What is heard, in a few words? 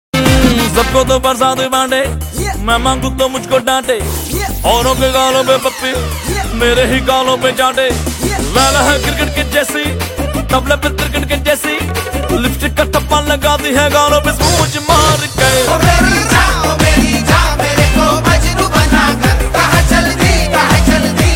pungi; alternative